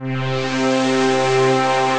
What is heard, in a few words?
Formant Evolve Pad; Synth Pad; Ableton; Factory Packs; Synth Essentials